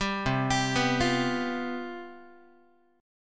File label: C Major 7th Suspended 4th, down, Guitar